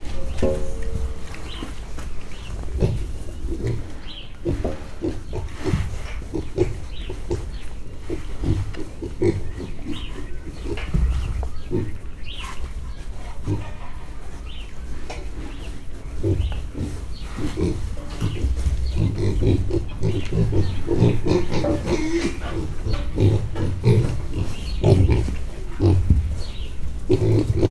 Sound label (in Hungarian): 10 hetesek hangosodnak, mangalica; Hortobágyi Nemzeti Park